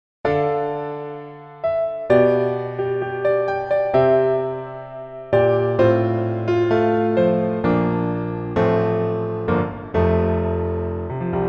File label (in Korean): Piano MR 멜로디MR 남자키